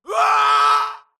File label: grunt death crush; Titanfall 2